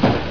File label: KLONK1